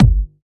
short steel kick drum g key, Royality free bass drum sample tuned to the G note.